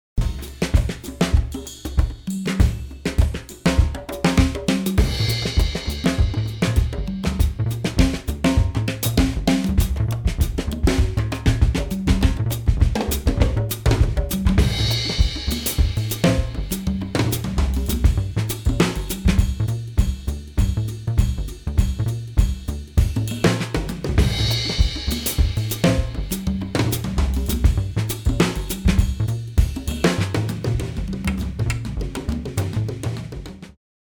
Parallel Distortion Drums Bass Unprocessed

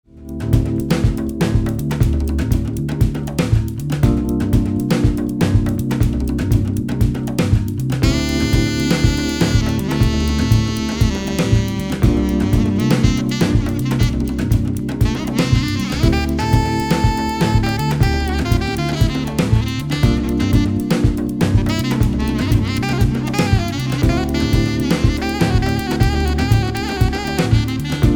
Sax With The Music

